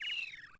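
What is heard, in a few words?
ruins ambience; poolofradiance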